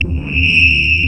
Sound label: ELEC; East West - Global Sounds; SPATIAL